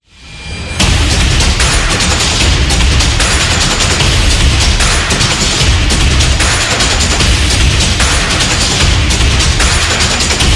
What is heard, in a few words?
Fast Intro, war drums